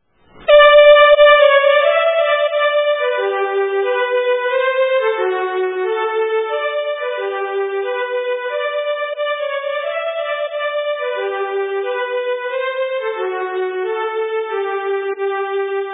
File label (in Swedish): Polska